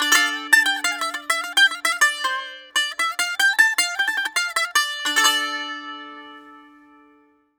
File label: BAGL D, BAGLAM, Mediteranean Traditions